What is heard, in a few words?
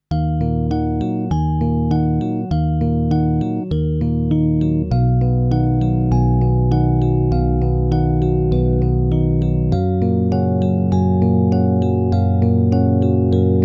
bittersweet rhodes